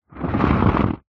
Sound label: vehicles car tire scrape drag ground